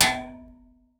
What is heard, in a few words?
metal barrel hit deep, deep impact